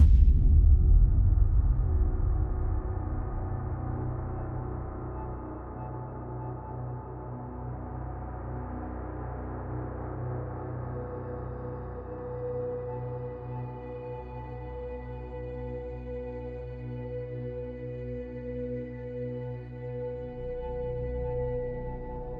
Before Start; monster energy supercross the official videogame 2

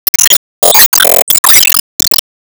Enemy Tower Charge Complete

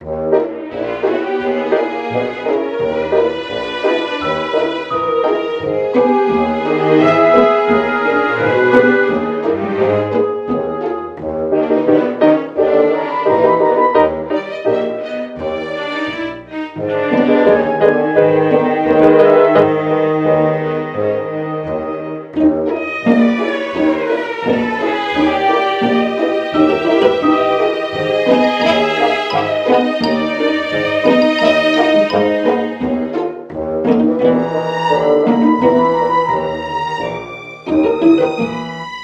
gramaphone vinyl; Don't Starve Giant Edition; PS Vita

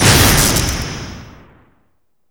Enemy Dress Common Active Attack Hit; Disney Star Smash; Android, iOS, Mobile

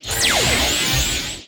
Spin Blade Loop

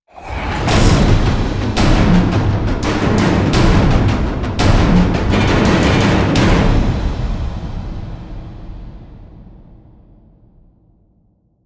Stinger Fill

